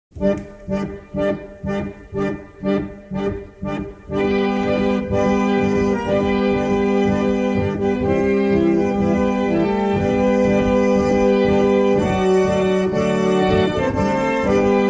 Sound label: organ postlude